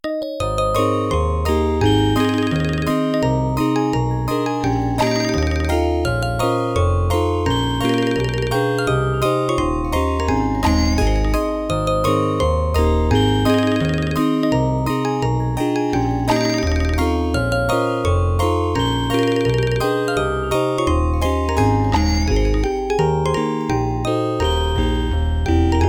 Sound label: Instrumental